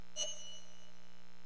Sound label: Cursor Move Sound